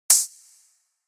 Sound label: E Shaker single